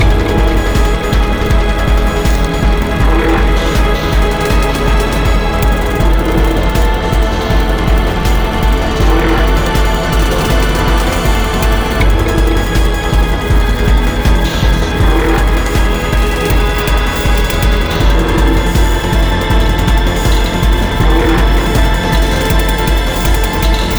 Steelworks Fast; rive wreck hack die retry; ps4

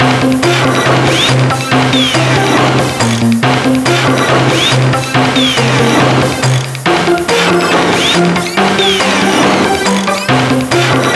reveal music, construction; Birthday Party Bash; Wii

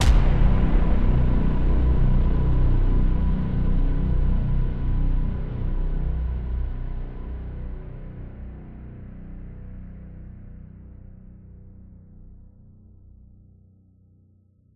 Horn, No Piano; Desolate

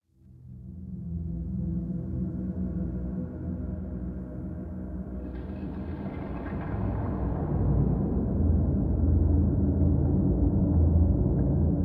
Ruins Alternate Intro Music; Shu; ps vita ps4 switch windows

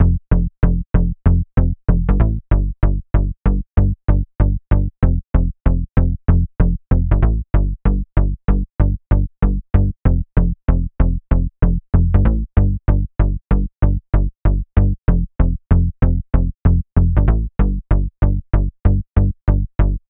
Bass; Vostok Inc.

